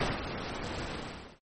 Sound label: Expl chiptone; gridtracker